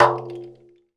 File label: Doira Tik; Roland BK-5; Percussion